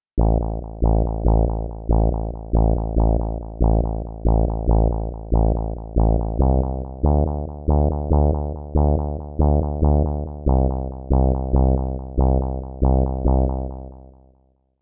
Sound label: HARD BASS 140 A, Hard Trance, Bass